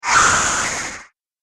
Whoosh An Abrasive Swipe; Whoosh Sound Effects; Swish, Zoom, Swipe, Whoosh, Swoop; Andrew Fleming

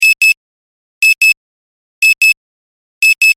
household clock digital alarm beeping; Digital Alarm Clock Tone; Alarms; Electronics

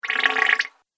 Water Fast Little Bubbles; Splish, Splash, Splosh!; Andrew Fleming

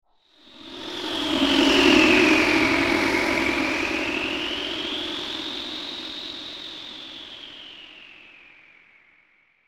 Magic Carpet Deluxe, magic sound effect; Fantasy sound effect; role playing games, magic videogames

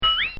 Cartoon Sound Effects Ping; Cartoon Sound Design; Bobby Cole

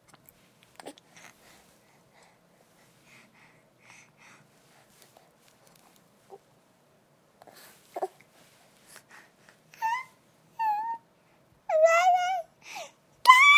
Baby 6 Month Old Breaths Happy Squeal; Babies

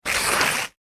paper sugar rip, Paper Ripping (Sugar Paper); Sheet Of Sugar Paper Ripping / Tearing; Objects; McKinney Sound

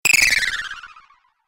Tap Fast Fail, tapping; object sound effects

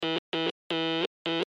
Morse Code F Letter; Blastwave

